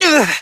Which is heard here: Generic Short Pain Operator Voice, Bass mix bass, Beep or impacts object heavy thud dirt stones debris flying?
Generic Short Pain Operator Voice